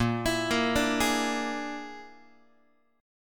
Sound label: Bb 7th Flat 5th, down, Guitar; x,6,7,8,6,7; 4A,4E,5A,5D,5Gsharp,6Csharp; Bb7b5 arpeggiated; JGuitar